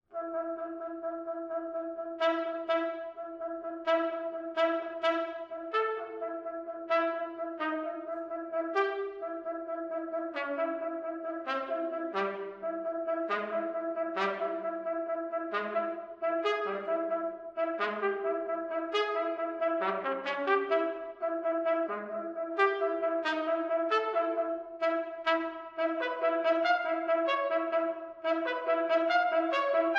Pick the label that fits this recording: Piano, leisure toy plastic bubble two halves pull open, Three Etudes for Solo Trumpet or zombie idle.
Three Etudes for Solo Trumpet